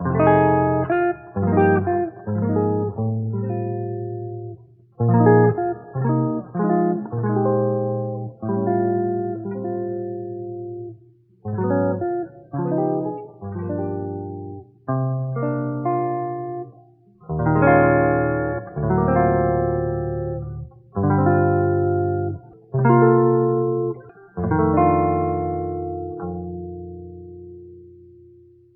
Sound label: guitar so many random plucks, Loops; Phonky